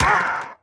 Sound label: death, parrot; mob; minecraft